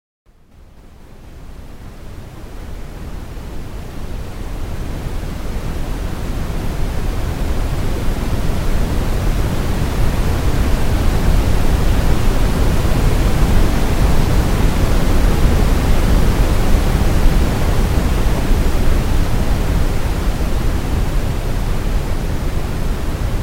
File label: Oscillating Pink Noise